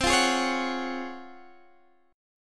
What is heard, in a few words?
C sharp Major 11th C